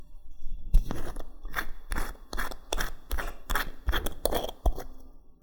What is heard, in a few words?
Unscrew Cap